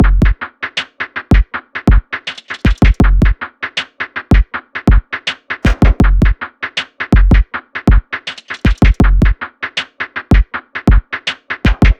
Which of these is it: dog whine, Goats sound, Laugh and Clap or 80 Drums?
80 Drums